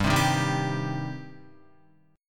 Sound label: G Suspended 4th Sharp 5th G down Guitar; Gsus4#5 strummed